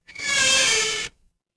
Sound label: door creak